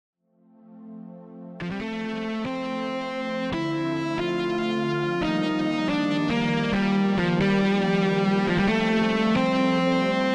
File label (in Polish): wersja instrumentalna